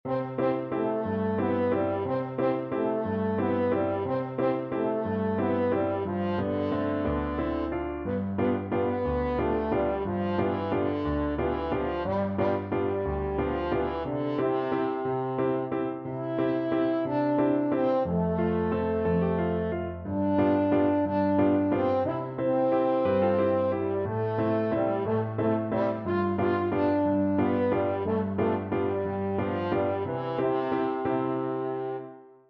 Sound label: french horn